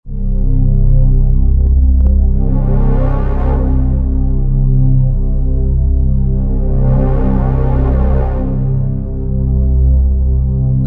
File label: Drone - Pipe, Roland JV-2080